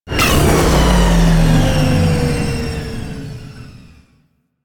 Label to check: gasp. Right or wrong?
Wrong